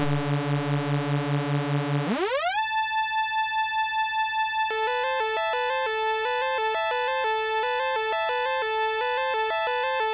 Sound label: Music, BASIC; Wood Steve